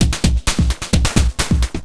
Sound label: Beat, Industrial